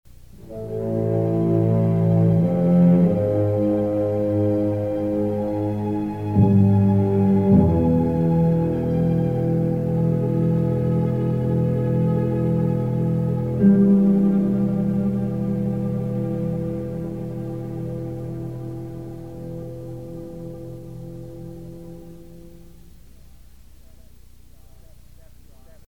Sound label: Suspense